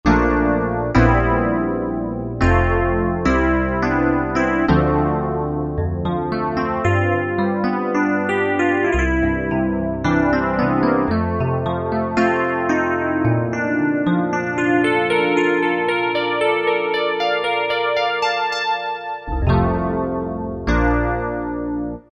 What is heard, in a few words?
E.Piano 3, Korg M1; Factory preset examples